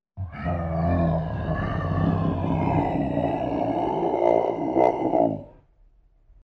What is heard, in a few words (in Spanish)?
llanto de bestia; SONIDOS TERROR